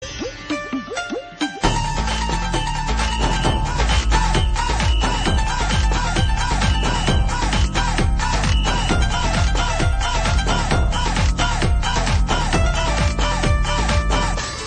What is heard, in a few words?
Old Music Tone